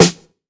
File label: nice steel snare drum sample f key